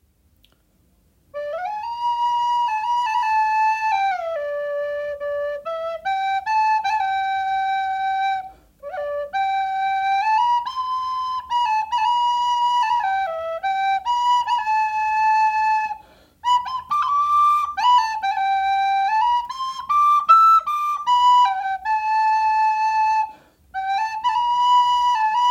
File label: Tin Whistle